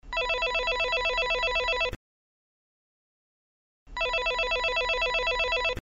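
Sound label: zvuki domofona, intercom sounds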